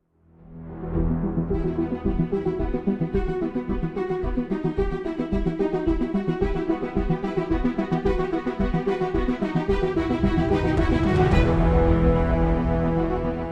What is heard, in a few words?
Music Ringtone